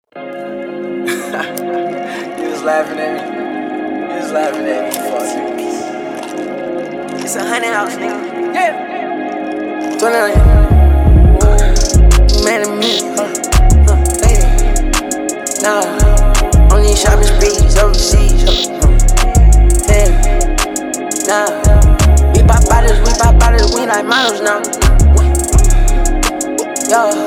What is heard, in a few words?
pop bottles